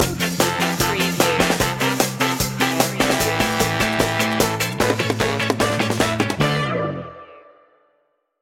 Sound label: ska stinger